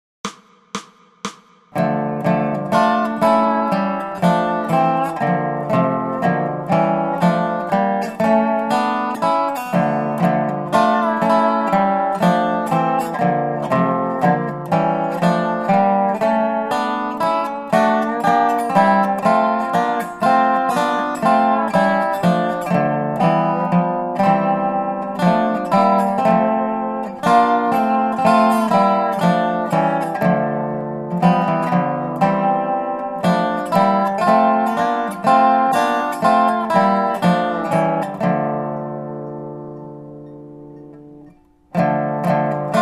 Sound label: Dobro; God Rest Ye Merry Gentlemen